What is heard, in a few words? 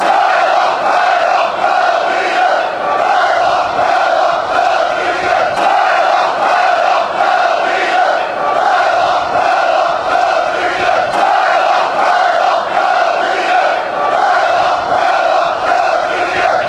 agf chant, fanchants, Denmark